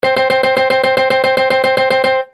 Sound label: slots win